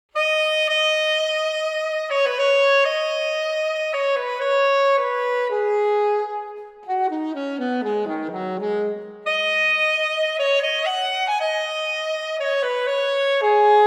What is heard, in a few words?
sax piano